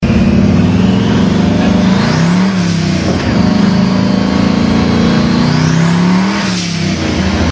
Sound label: Twin Turbo